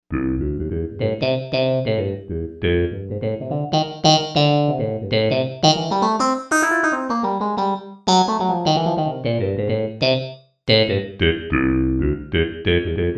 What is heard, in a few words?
Voice, Korg M1Rex; Factory Patches